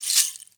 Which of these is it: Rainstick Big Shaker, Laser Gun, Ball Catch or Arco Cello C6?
Rainstick Big Shaker